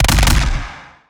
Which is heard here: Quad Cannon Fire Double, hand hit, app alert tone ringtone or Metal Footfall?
Quad Cannon Fire Double